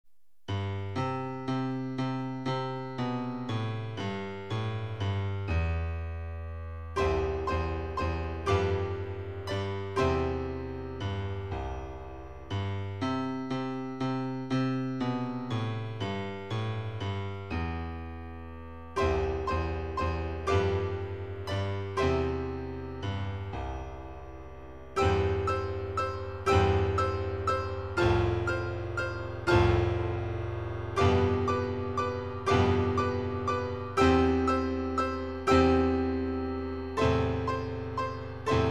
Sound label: piano